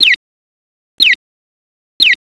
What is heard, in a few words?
cheep